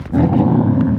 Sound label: Growl